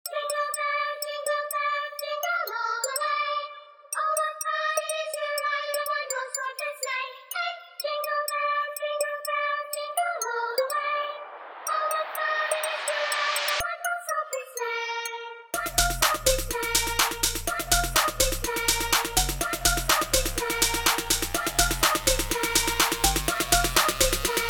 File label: jingle bass jingle bells remix; Père Noël